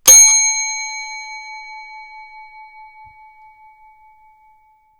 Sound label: service bell